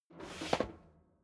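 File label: step leather shoes metal rattle scuff, footsteps; schritte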